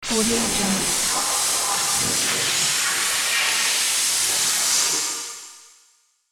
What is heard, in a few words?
electronic whoosh; transitions and movement